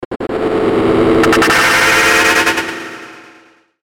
Ascend Static Stutter Swell Delay; Blastwave

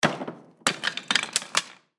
Crash Wood Throw On Pile Large Impact; Sound Effects:Materials; Blastwave

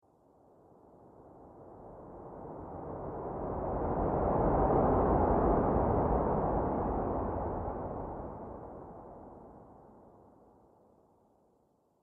Rumble Whoosh Faint; Whoosh Sound Effects; sound design

